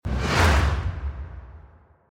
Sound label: whoosh achievement